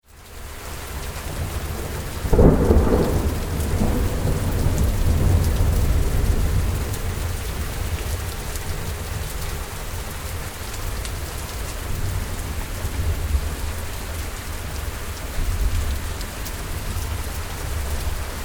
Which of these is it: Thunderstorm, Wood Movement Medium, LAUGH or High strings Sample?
Thunderstorm